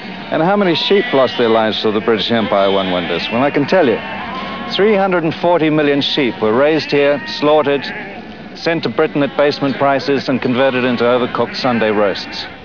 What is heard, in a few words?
sheep